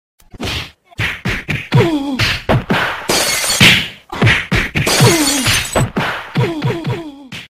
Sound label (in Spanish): Efecto de Golpes